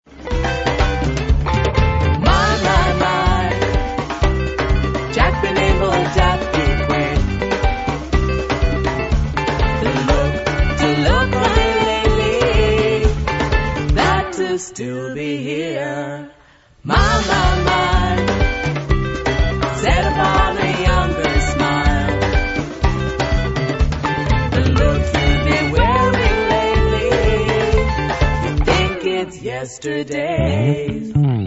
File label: rythm stick